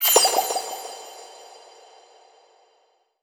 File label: rizline multiplayer soundfx connection successful sound effect